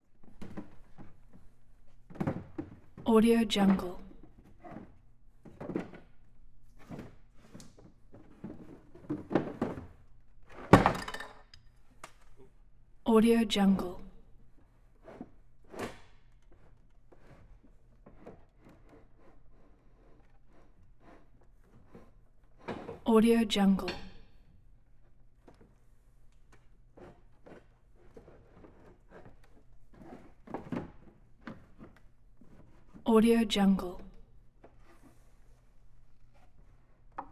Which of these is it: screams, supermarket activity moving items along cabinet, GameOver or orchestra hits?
supermarket activity moving items along cabinet